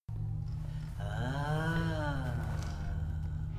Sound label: aaaah